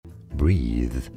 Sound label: Breathe